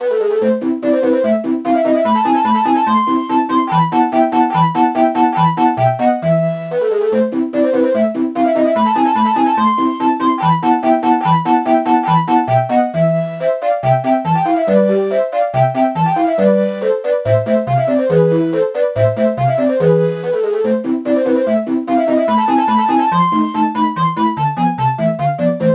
shakuhachi

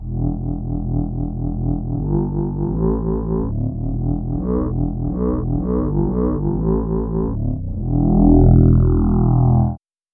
accordian bass samples

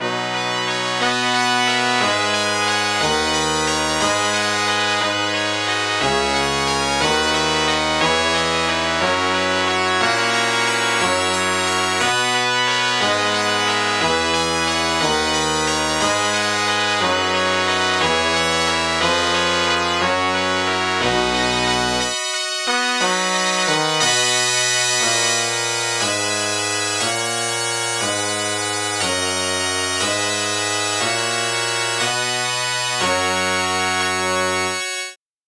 trumpet

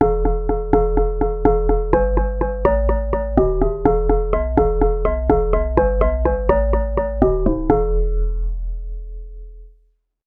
vibraphone bass

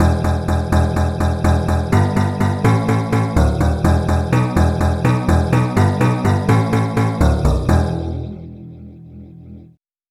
dulcimer bass

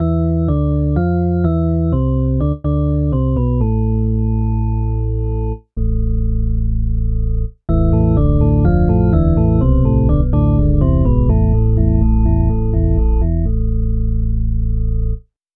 drawbar organ

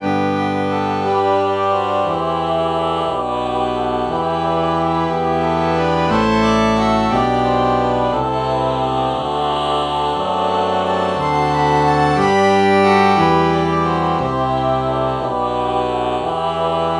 reed organ ah morph

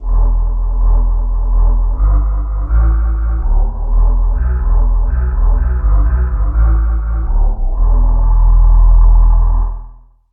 solo vox bass

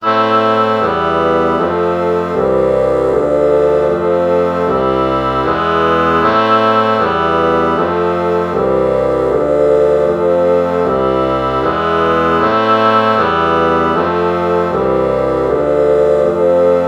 oboe bassoon chord morph

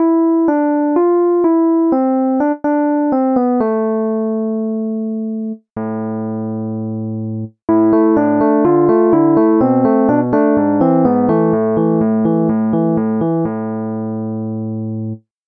rhodes ep; SpectMorph Evaluation #4

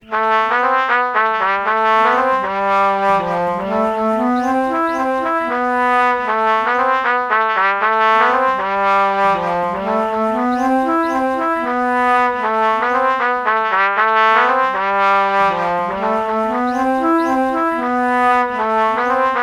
alto flute trumpet melody morph low

